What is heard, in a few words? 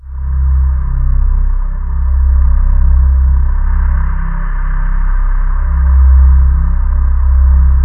JV dark vox, JV-1080; Syntec; Wall of Sounds